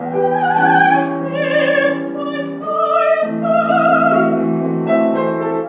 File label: piano and opera voice; MELODIC LOOPS; SAMPLED MUSIC LOOPS; wagner